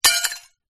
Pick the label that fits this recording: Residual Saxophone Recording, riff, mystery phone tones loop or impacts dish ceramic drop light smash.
impacts dish ceramic drop light smash